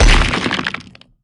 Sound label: nether rack destroy